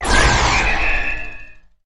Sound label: Scifi Dome Shield Activate; Energy & Forcefields